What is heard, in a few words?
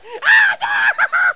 HURT